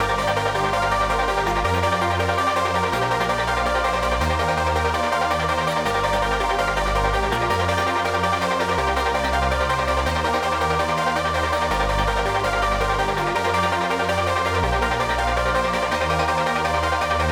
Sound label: Chorus Synth, modern metalcore